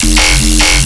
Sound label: Bass Hit F, Dubstep